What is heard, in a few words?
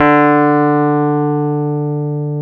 D 5, Electric Pianos; Wizoo Electric Pianos